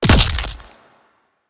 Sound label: hit; smite; arthur skin9